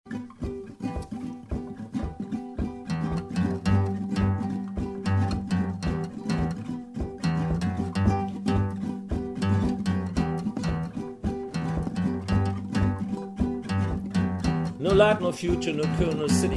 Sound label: rap